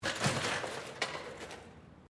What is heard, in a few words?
Bicycle Mountain Bike Fall Gravel, Bike being thrown around to simulate crash impacts